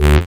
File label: SYN DANCE; Sound & Vision - Gigapack II